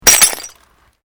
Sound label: GLASS SHARD SMASH; Material components for constructing more complex sounds; Materials